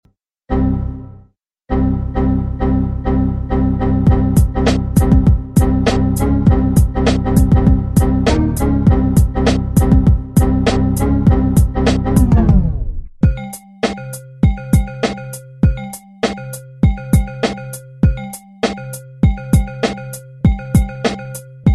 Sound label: Windows Music